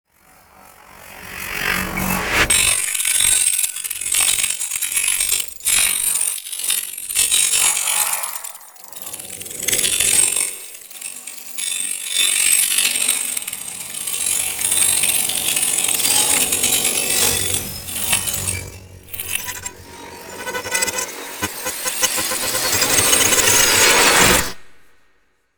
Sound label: Storms Swarm; Gothic Storm Music; Advanced Sound Design 2012